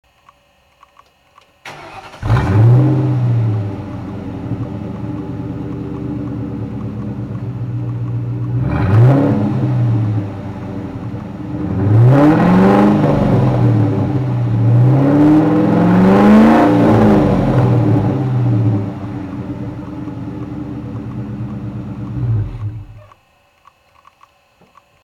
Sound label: mustang; bullitt; xpipe